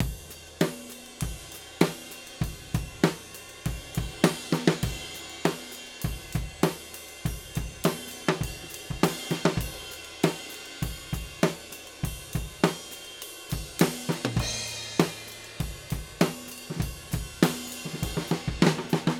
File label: Drums